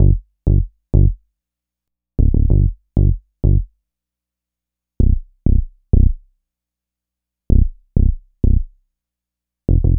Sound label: Dubby Bass; Dr. Fink's Funk Factory